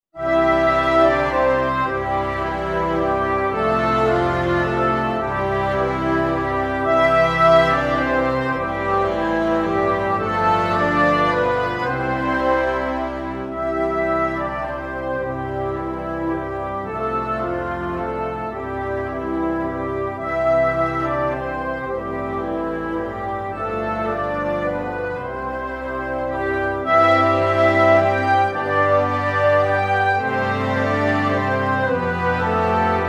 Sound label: score with brass; Blow the Wind Southerly